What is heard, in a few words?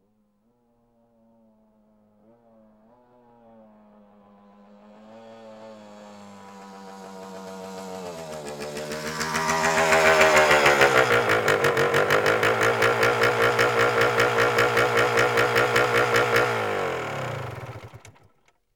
Motorcycle Dirt Pull Up